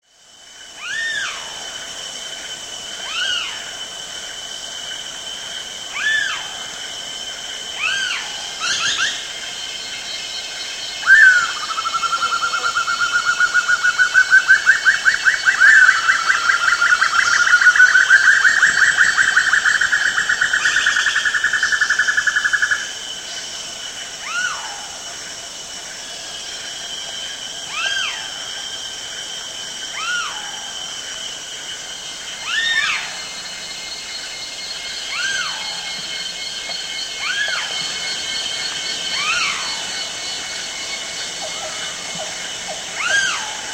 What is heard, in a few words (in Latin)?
eurylaimus javanicus, ptaki; szerokodziób purpurowy